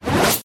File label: Teleport; Nickelodeon - Capture The Slime